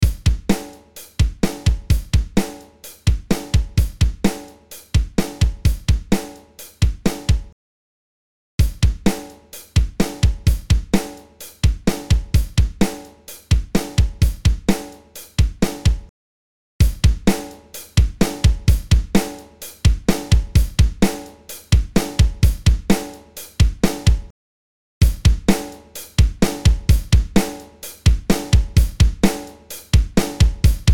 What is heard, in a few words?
Drum Studer Dry Mix sat